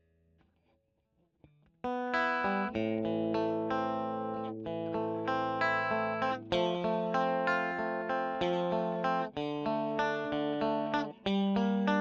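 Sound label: Electric Guitar; Royer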